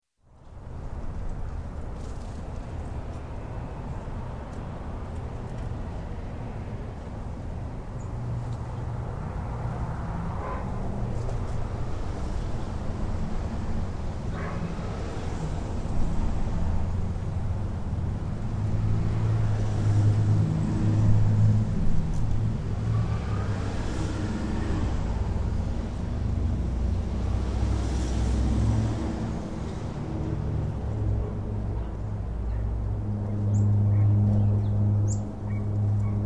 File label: Residential Birds, Dogs, traffic; Plane; Sound Ideas; Series 2000